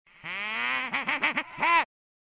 wah; reverse

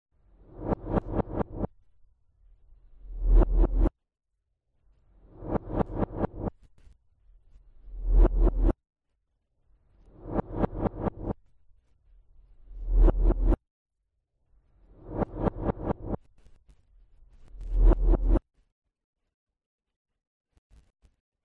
knock, reverse

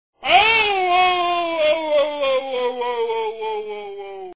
toad; doublevape

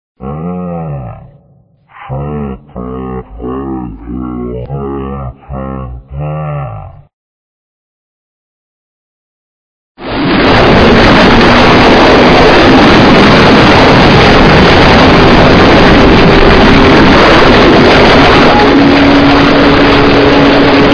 pee; vapesquared

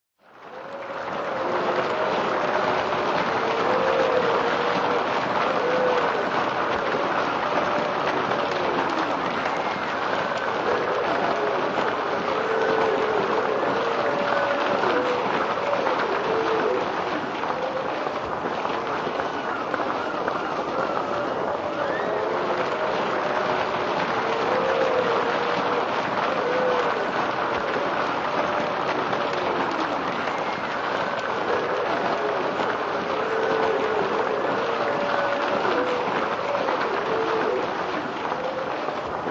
applause

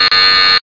fail

